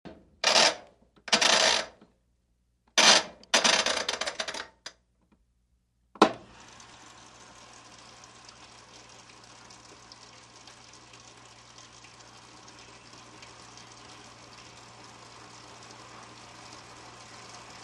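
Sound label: Wash Mach Rotary Switch, washing machine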